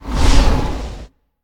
slither; smite; kukulkan

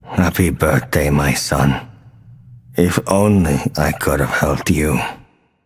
boohoo; Smite; bacchus

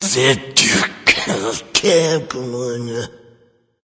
grumbling; cuchulainn

